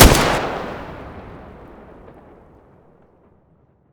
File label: svd muzzle mono distant